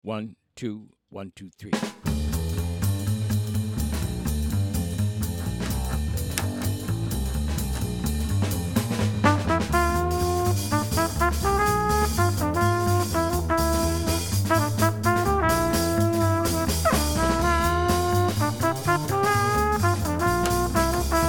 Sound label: minus Piano